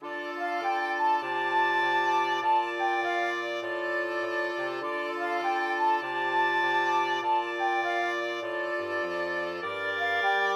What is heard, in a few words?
cool blues, flexible mixed ensemble 4 players